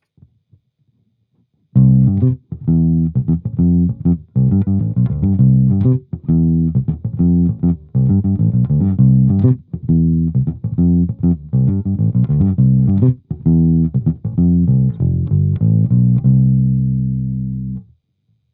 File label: markbass micromark, bass